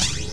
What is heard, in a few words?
beat, drums